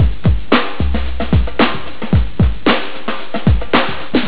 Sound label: BEAT